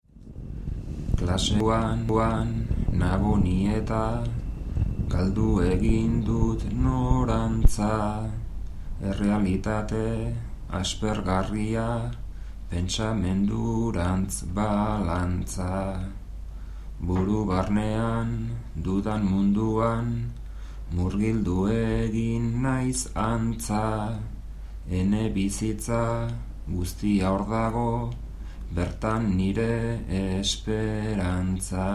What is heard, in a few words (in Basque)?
bertsos